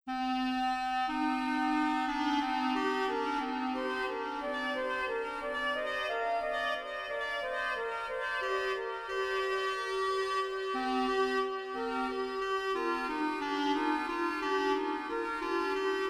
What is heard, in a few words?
gentle sound 432 Hz